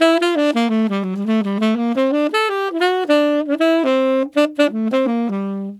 Tenor Swing Shuffle in Cmin7, Tenor Sax Loops; Sonic Foundry (Sony Creative Software); Jazz Solos and Sections

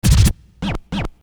Scratch, Hip-Hop

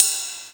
ride, Yamaha DD-8

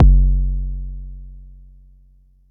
Bassdrum, Jomox Xbase09

